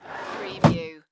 Desk Keyboard Drawer

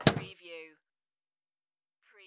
Pinball sound, clunk

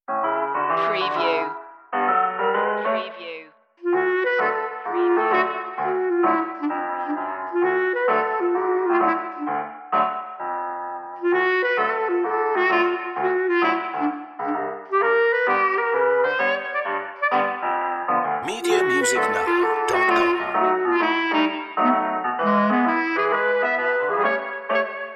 piano and clarinets

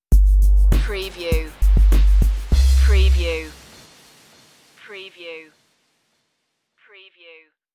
ocean breeze stinger

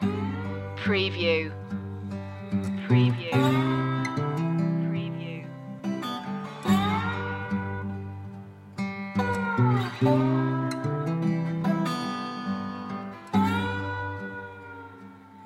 delta mercy loop

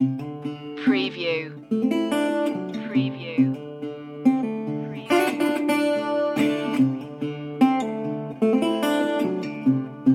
sand and rust loop

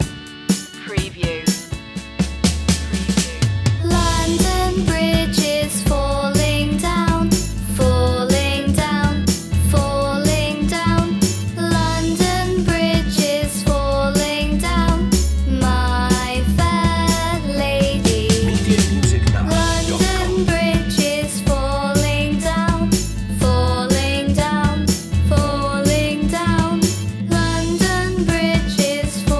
london bridge children vocals short

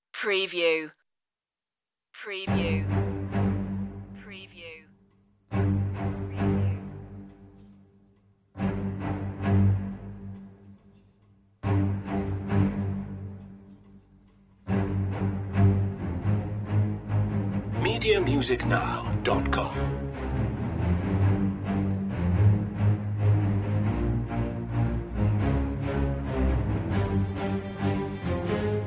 strings

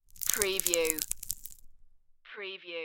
tiny crunchy bite

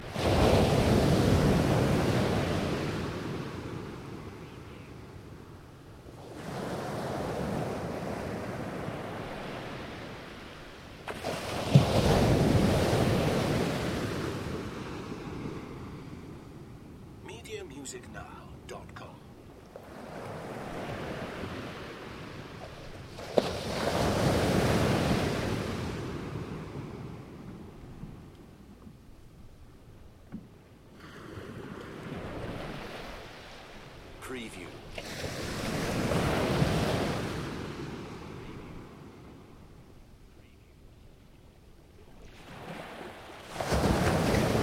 Crashing Waves Loop; beanstalk audio

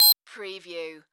Beep Modulated Single; sound-effects-bible

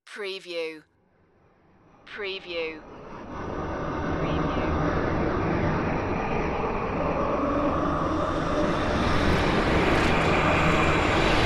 windy space anomalies

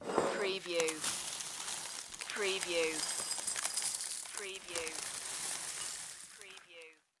Cereal Pour Into Bowl; sound effects bible

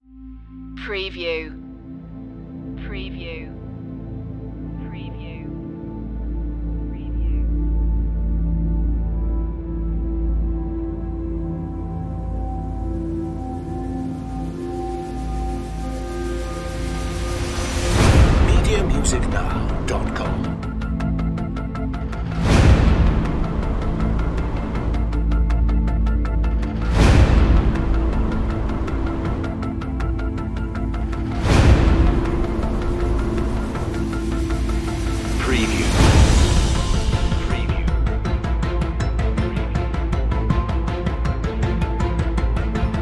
no brass

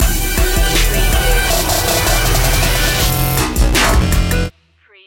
anomaly stinger

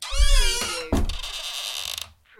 Door hinge squeak; beanstalk audio

